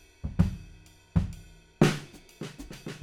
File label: Room A